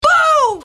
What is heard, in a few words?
Boom